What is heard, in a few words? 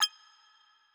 Future Sounds, Interface, Futuristic; ArmyRaid